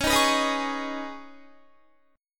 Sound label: Db 7th Suspended 2nd Db, down, Guitar; Db7sus2 strummed; tuning=4E,4A,5D,5G,5C,6F